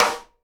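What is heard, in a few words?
SIDE STICK, Live Snares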